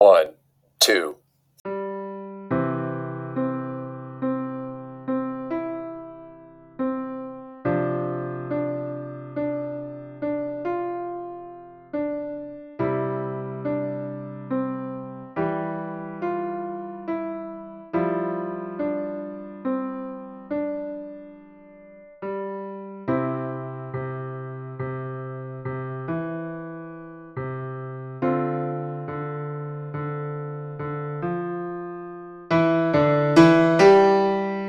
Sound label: Cockles and Mussels 70 BPM